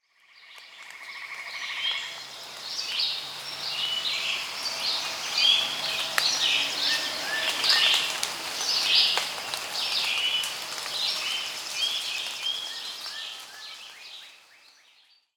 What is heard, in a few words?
Monkey Crowd; Pitch Black Audio Pong